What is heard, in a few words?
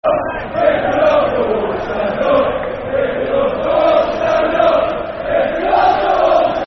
Stand Up If You Love The Wolves, Football Chants; wolverhampton wanderers; fanchants